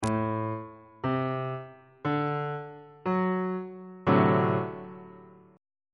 e double flat dominant 7th 2nd inversion on bass clef